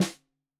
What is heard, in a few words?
Snare 65 Reg Stereo, Deeply Sampled Drums